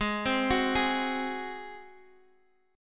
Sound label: G sharp 7th G sharp; G#7; arpeggiated; x,x,6,5,7,4; JGuitar